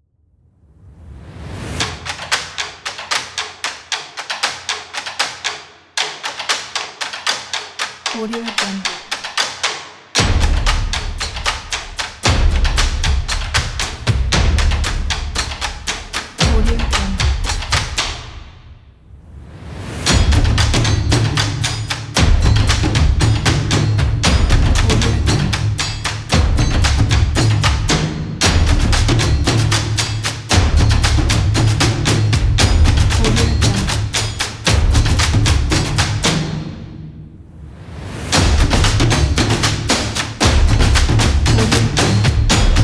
big drum intro

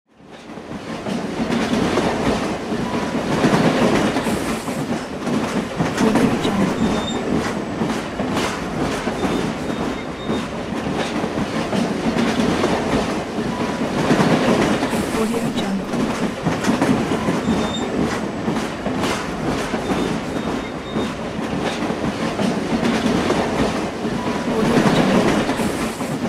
train riding

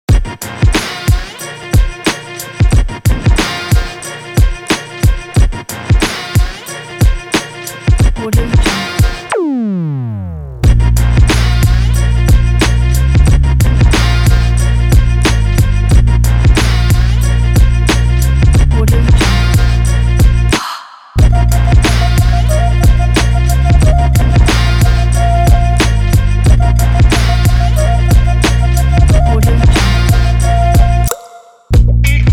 hiphop

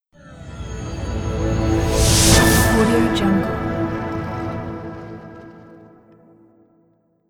bright modern logo

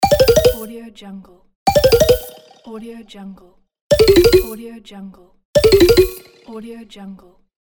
game failure

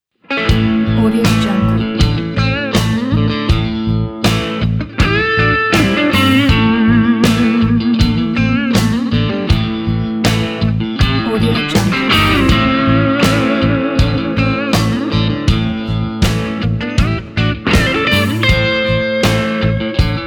true country american blues

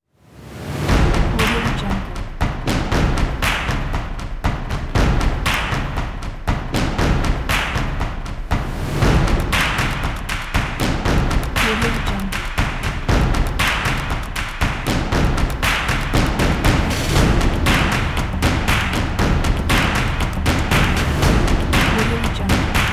dynamic stomps claps

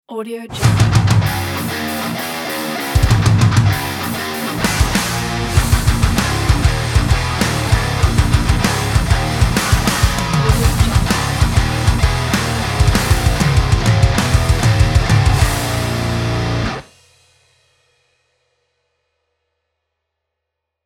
heavy metal opener

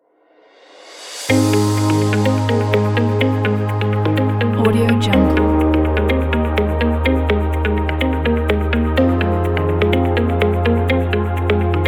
corporate happy

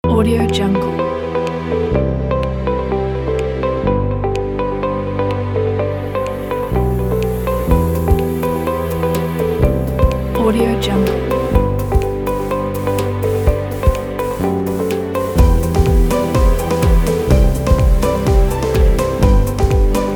inspiration motivational upbeat

